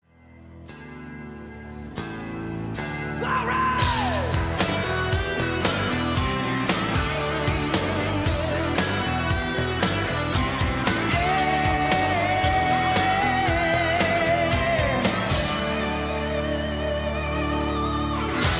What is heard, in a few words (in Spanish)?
Guitarra